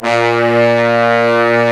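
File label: low brass, fat pop section; Roland L-CDX-03 Disk 2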